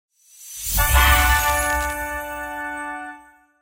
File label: win